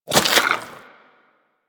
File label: Inf Throwing Star Reload, Infested; Warframe; Weapons